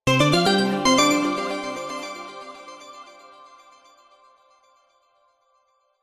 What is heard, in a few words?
game show musical jingle time up round digital synth